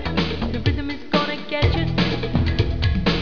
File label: Rhythm